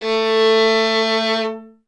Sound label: STR VLN, Vln Detache; Miroslav Vitous